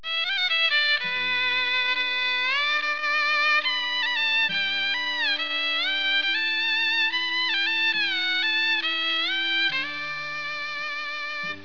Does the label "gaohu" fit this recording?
Yes